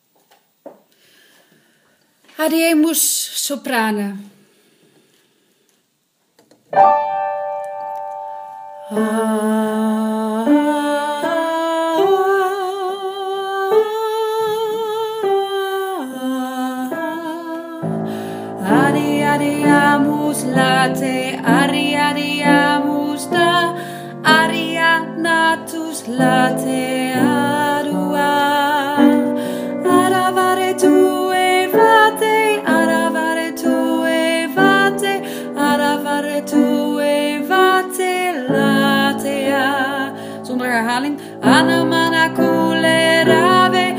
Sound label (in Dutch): Sopranen